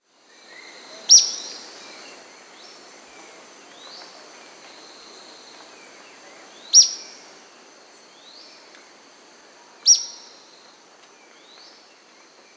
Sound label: Ornate Flycatcher (Myiotriccus Ornat; S. America